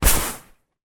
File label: household inflated rubber ring movement hard floor